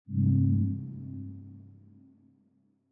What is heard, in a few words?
dark mode on